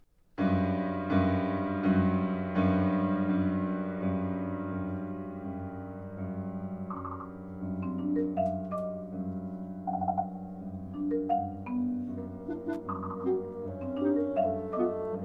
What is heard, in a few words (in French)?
Aquarelles Eaux fortes flute Hautbois clarinette cor basson; op 7; RTBF